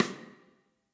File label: rimshot